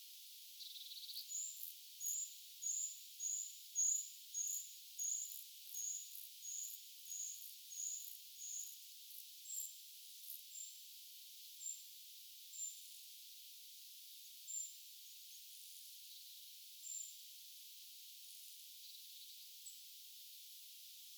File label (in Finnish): puukiipijan aantelya